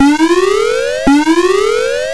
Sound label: boss warn; guardian legend; area9